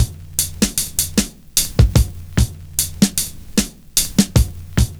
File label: NOISY, loop; Univers Sons - Kitch 70s